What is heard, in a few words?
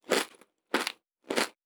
Food Eat; Survival Game Sounds - Lite Edition